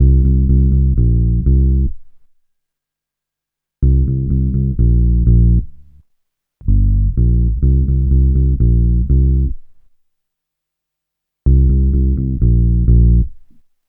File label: REGGAE C, Bass Grooves; USB Soundscan vol.30; AKAI